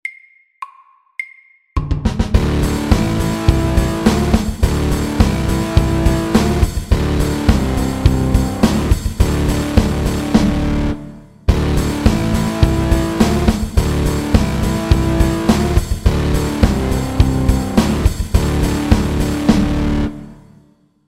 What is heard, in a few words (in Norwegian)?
Mountain rock FORT